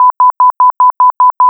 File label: Car Warnings; oviatt